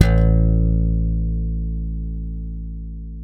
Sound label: ALEMBIC BASS; Masterbits CLIMAX 7 - Bass